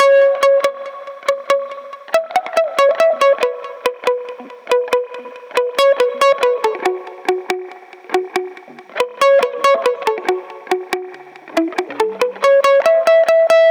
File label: 140 guitar electric mute Gbmin; agate